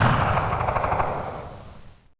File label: MP5 ECHO